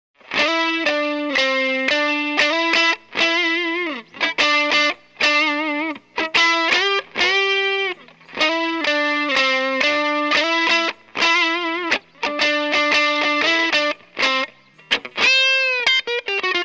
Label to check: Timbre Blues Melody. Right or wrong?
Right